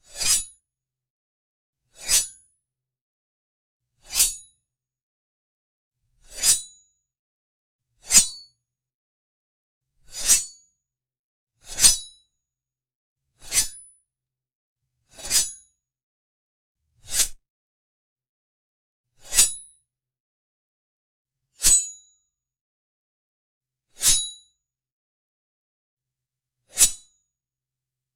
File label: swing whoosh sword; martial arts